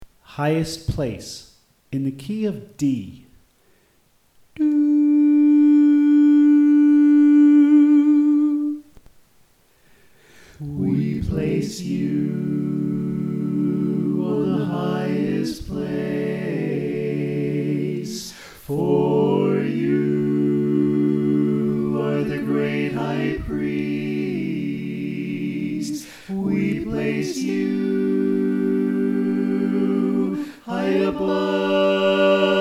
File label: Bass Left